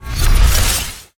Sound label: Cryogen Shield Regenerate